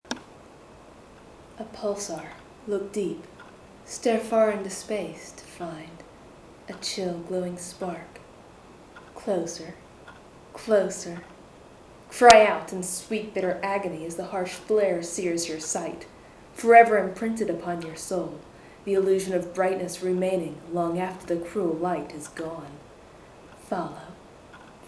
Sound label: pulsar